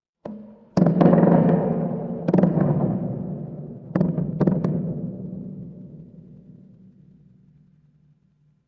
horror creak

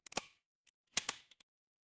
glass crack

